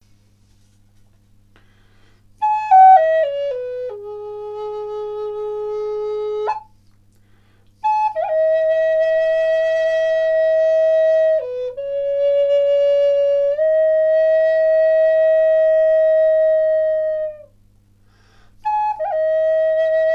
Son flute amerindienne la branche sculptee

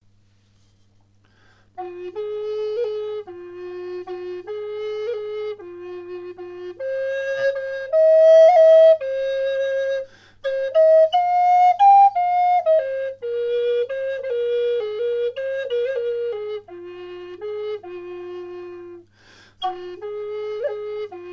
Fa 432 Hz érable

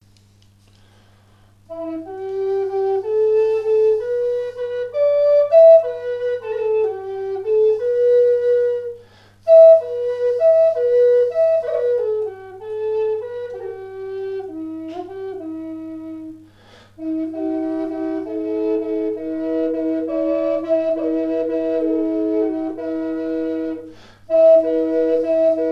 Son double flute amerindienne mi pin

